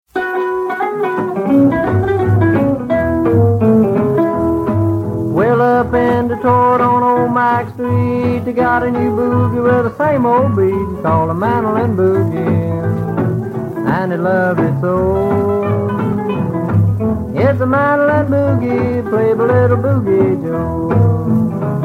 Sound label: Mandolin Boogie